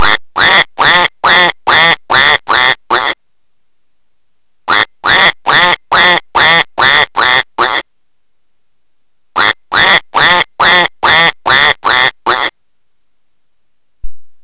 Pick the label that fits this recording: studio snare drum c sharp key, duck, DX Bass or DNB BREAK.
duck